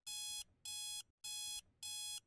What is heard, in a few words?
Rocket Warning; eagleboss